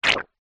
cartoon squelch

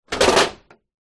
vehicles truck ramp metal lift fold

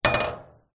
impacts metal pole hit metal panel

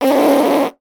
human blow raspberry

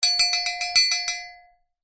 Cowbell

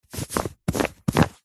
office glue stick apply glue to paper

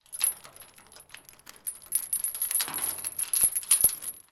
industrial chain padlock movement

